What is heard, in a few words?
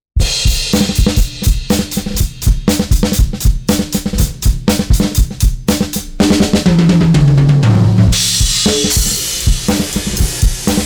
Big fat Kick; Dynone